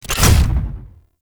Mech Shield Bash; Warframe; Nechro Mech; Abilities

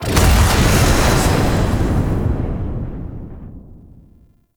Hand Fire; Duviri; Lotus Hand; Weapons

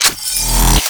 Shape Blast Shotgun Reload, Weapons; Warframe; Corpus

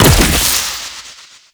Treasure Box Proj Impact; Warframe; ManInTheWall

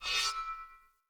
Polearm Whoosh Accent; Weapons; Player; Sphere

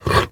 Kubrow Tame Idle Alert Turn B; Warframe

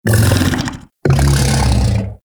Undazoa Vocal Tranquillized; Warframe; Hunting Critters; Characters

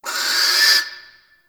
Owl Critters Vocal Alerted; Ambience; Warframe; Duviri

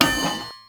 Street Sign Destroy; Warframe; Ambience; NineteenNinetyNine

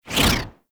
Fly By C; The New War; Archwing; Cinematics

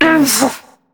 Struggle Short; New War

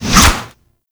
Whip Whoosh, Weapons

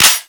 Pistol Mech; Warframe; Weapons; Tenno; Cowgirl Pistol

